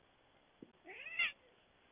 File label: cat meow